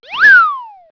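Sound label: zip down; Microsoft Graphics Studio; Home Publishing 99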